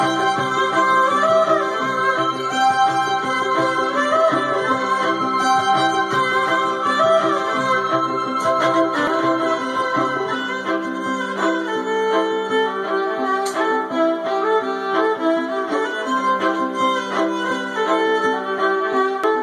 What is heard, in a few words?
violin